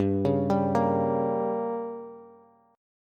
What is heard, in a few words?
G Diminished, Bass; arpeggiated; JGuitar